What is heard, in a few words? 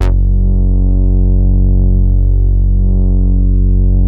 BOUNCE, BASSES